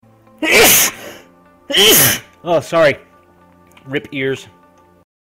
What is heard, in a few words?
sneeze